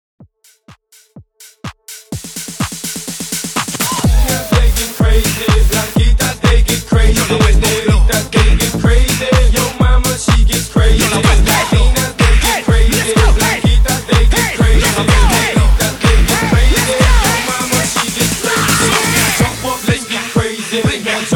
125 BPM

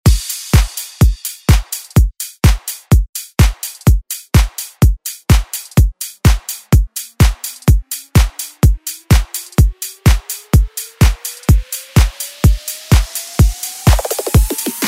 tech house; Deejay Hit

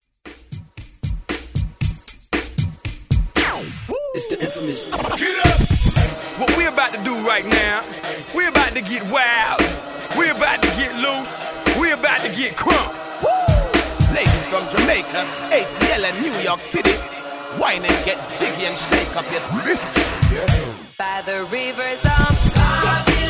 70s Hype ReDrum 115Bpm; DJDOLLS

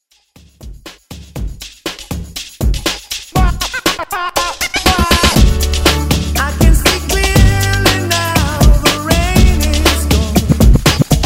90s Reggae

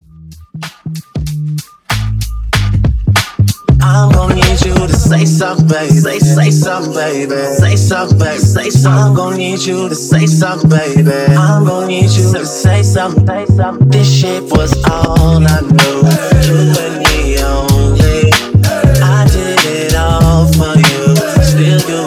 Extended 95 BPM

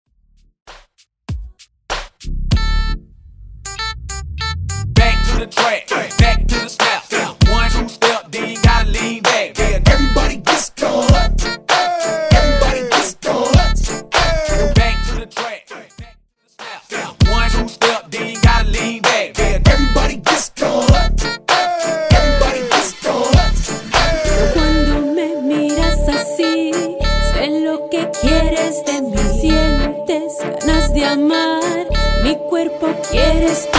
Hype Latin Redrum

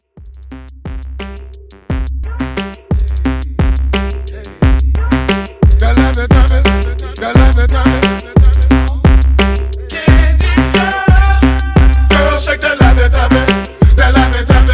hip hop, 88; 2024 Hiphop Mashup

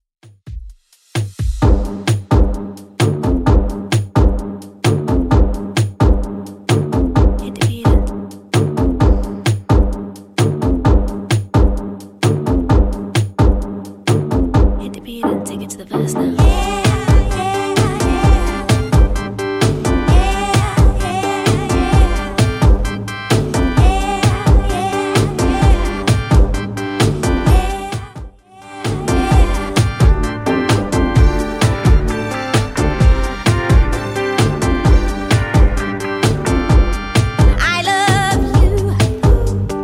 2ks Redrum 130bpm

